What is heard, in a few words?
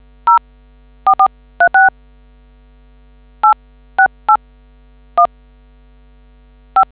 dtmf